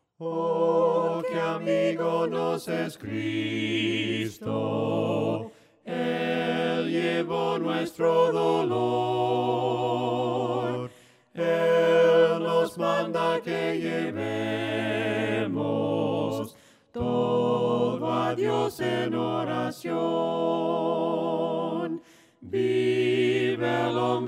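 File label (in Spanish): OH QUE AMIGO NOS ES CRISTO VOCES, himnos en piano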